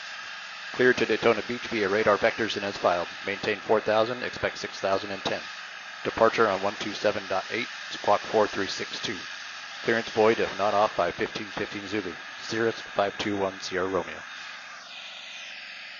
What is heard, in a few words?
Cirrus SR20 Bose A30; Zulu 4; sound comparison